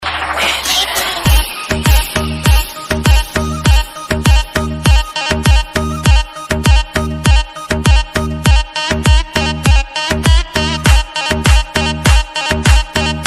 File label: Mobile ringtone